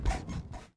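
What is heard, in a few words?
Capsule Bounce Medium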